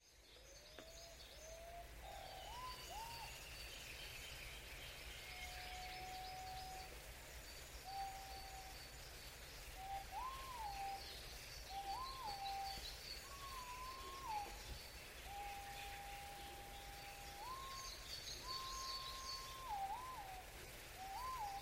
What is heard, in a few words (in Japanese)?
aobato, bird